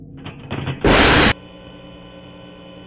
death sound; fnaf

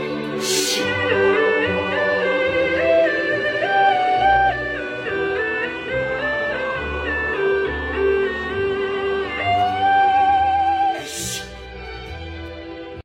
hymn sheesh

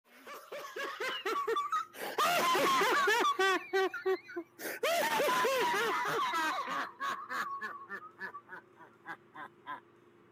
laugh

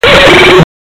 Roadrunner Horn Beep Beep

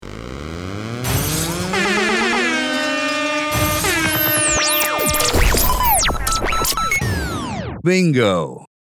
jingle

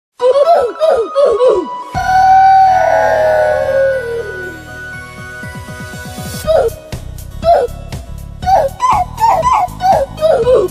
death sound; wii sports theme; roblox

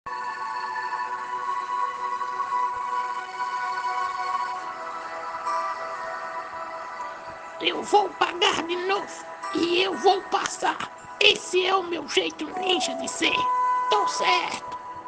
sad song; naruto

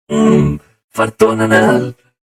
fart on anal

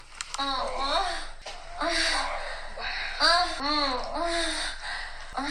moaning sex

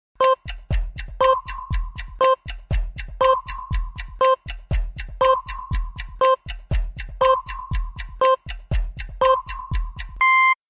minimal countdown 10 seconds

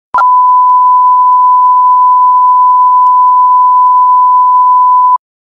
please stand by video effect

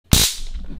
stun gun sound

